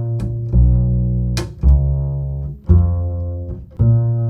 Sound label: Acoustic Bass, Loops; ACID; Basses